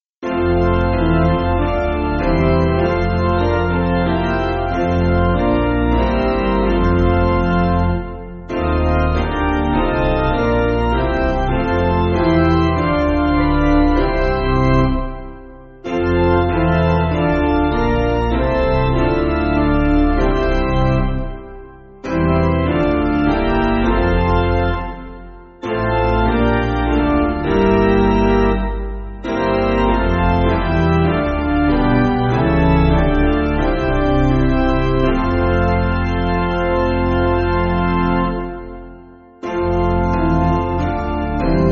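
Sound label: Piano, church backing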